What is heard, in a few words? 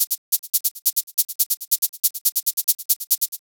140 House Shaker; Dance DJ; Percussion; Shakers; Pro Tools Essential Loops; Digidesign